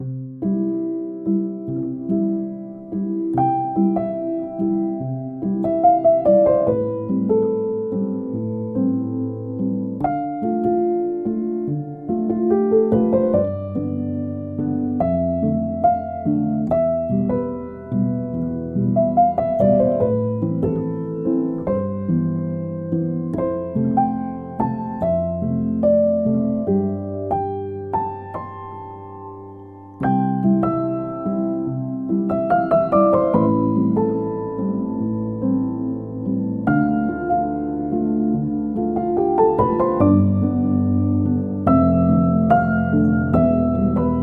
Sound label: song calm nature; dolphin tunes